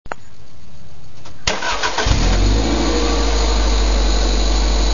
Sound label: remus dual, exhaust